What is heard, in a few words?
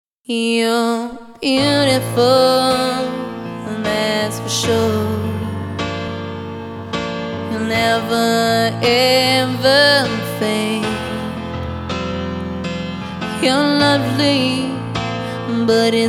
Acoustic